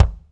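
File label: BD, bass drum; Real-Kit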